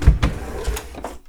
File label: Draw, household rhythm samples Hits